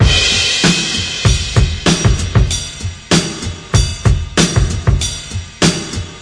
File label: classic brk; VIBRIS CLASSIC BREAKS